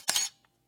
Enlarger Focus In

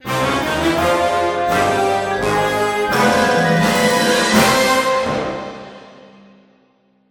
Celebration Stinger; Disney Infinity

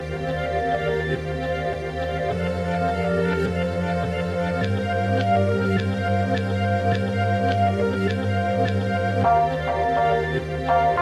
CarDealer Music Loop; gear club

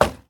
Metal soft

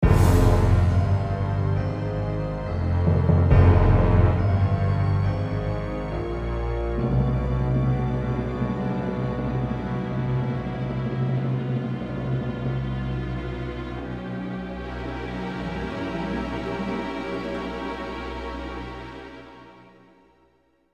Failed Music; 1944 battle of the bulge windows gamerip 2005

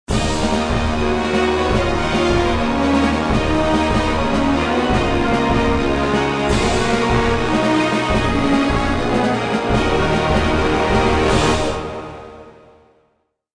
Industrial Combat; Civilization Revolution

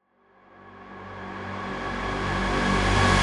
trasitionriser 120bpm 7beats

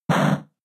Hit Weak; attack moves; Pokemon; Gen 4; DPPL HG SS

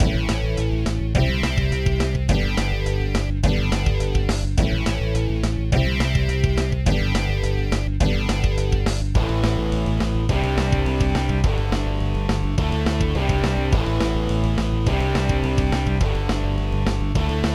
Punk Rock; Dream Salon; nds

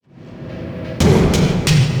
Escape Restart

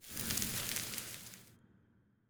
Object Grow Flora; LevelEditor

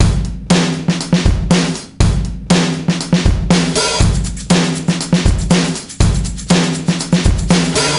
Blues Rock; gamerip; Happy Feet Two; nds

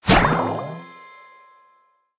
Powerup Power potion; Angry Birds Rio

